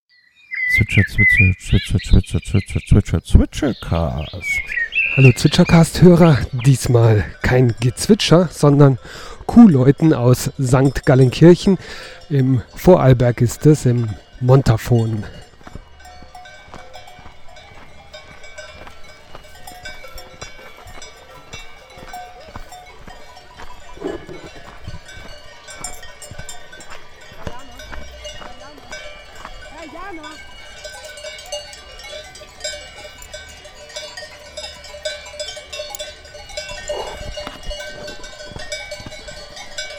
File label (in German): kuhglockenkonzert